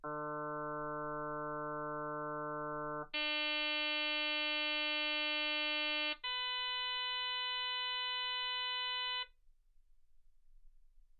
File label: harm sinus 48kHz